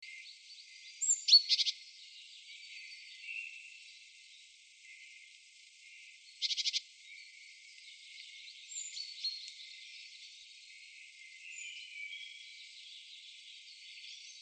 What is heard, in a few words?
Parus major; SETE